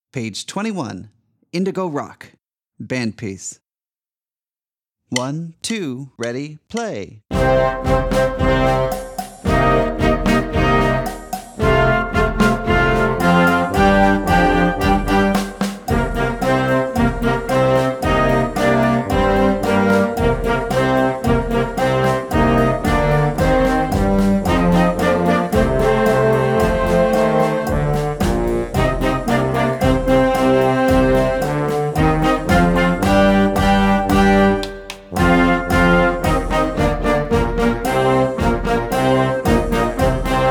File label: Indigo Rock